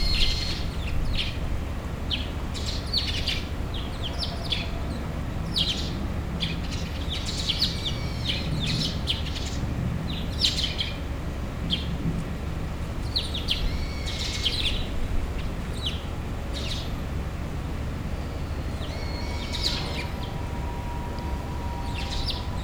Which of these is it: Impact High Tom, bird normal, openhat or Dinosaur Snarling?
bird normal